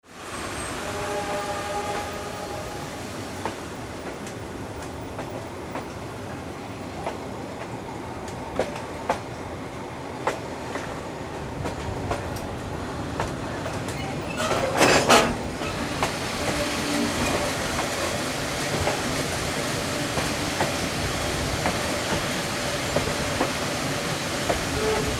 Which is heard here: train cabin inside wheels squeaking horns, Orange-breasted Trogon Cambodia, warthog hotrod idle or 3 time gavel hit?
train cabin inside wheels squeaking horns